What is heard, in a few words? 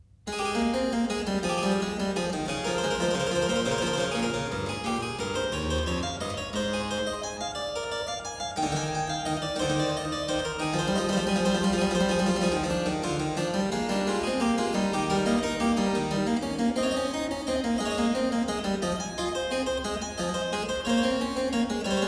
Zweistimmige Inventionen in A major, Keyboard Works; BWV 783; J.S. Bach; Inventionen & Sinfonias